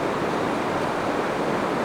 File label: wind outdoors, ambience